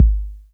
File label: BD 808 Tape Decay